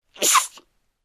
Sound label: Teenager Sneeze